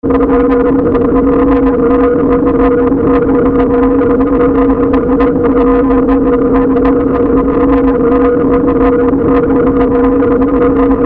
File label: distorted drone